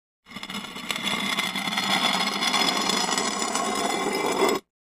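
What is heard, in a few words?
Large Tile Square Scrape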